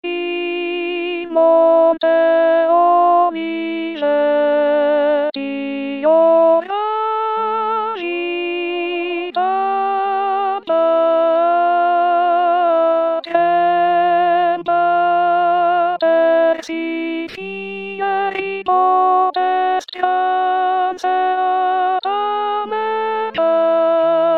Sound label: in monte alto, vox; 2016 vox maria